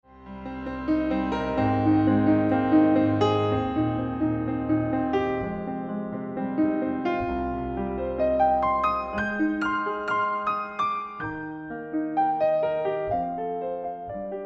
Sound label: Piano Improvisations Live; Live at Bernies